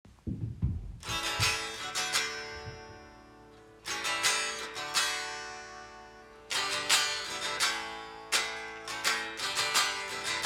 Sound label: acoustic